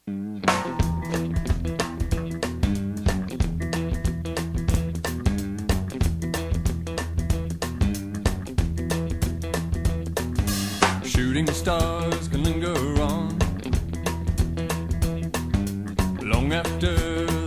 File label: Grooves